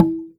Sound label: notification